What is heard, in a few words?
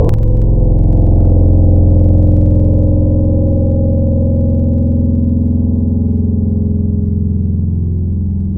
sitar; Synthetic's Serum Collection; Chill Trap 2 Tables